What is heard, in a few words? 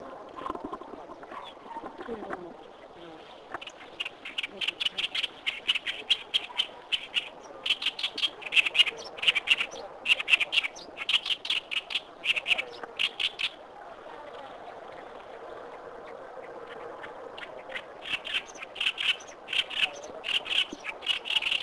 acrocephalus orientalis